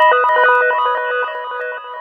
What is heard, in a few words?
lead (sparklecho); xtra lead; Sonic Foundry (Sony Creative Software); Ugly Remnants VOL-2